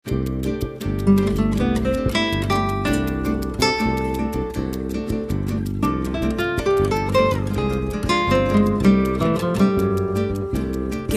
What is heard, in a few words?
Brazilian Cafe